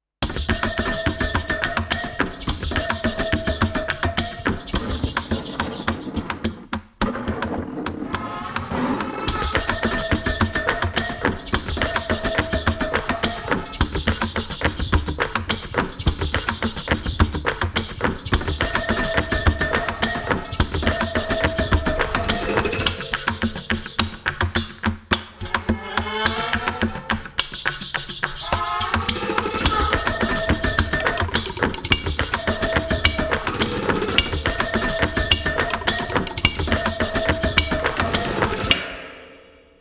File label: Fan the Campfire Pot mono mix; TRANSITIONS; overture center for the arts madison wi